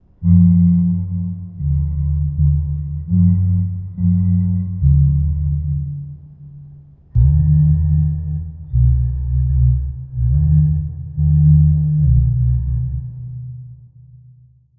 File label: Male Singing; Phasmophobia